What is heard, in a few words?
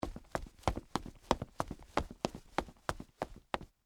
Female Boots Jog, Footsteps, Concrete, Short